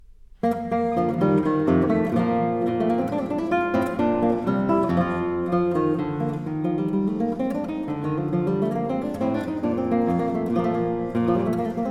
Cello Suite No 4 in E Flat Major, guitar, Courante; Bach Transcriptions and Arrangements for Guitar